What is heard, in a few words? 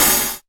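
hihat open, Korg DDD-5; Pitch - +64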